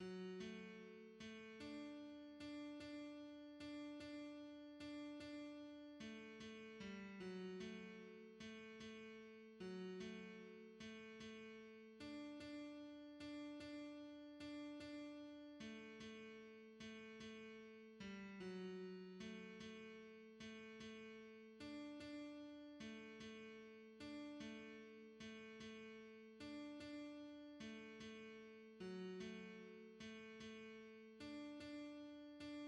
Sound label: tenor; O Dieu supreme est ta grandeur; hymns